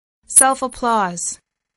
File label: self-applause